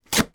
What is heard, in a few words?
small card box lid rip off